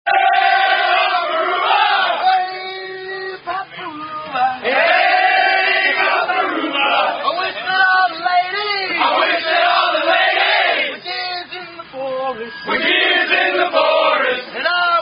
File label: Rugby Drinking Songs, Call And Res; I Wish All The Ladies Roll Your Leg Over